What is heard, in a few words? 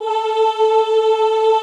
OOH A3, Classical Choir; Best Service; Extended Classical Choir